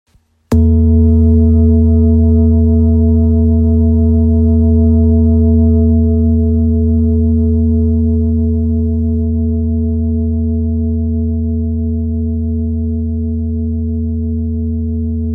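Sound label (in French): Bol ramgandi mi, bol chantant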